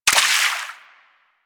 Water Sprayer Surface Impact; Warframe

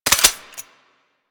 Bladed Pistol Reload; Warframe; Tenno

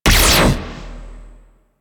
Laser Rifle Fire; Crp Laser Rifle

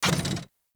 Mech Step Arm Up; Nechro Mech; Vehicles

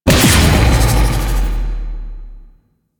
Howl Damage; Warframe; Voruna

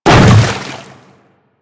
Hammer Boss Step Water, Footsteps

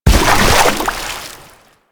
Nechro Mech Step Surface Water; Step Surfaces

